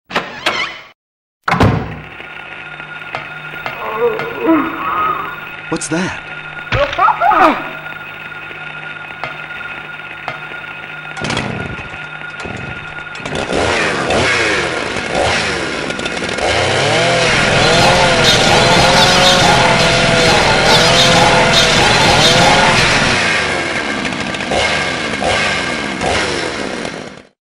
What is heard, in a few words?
Chainsaw Remix